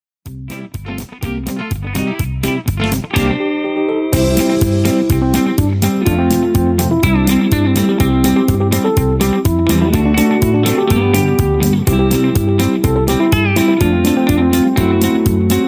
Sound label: instrumental Leads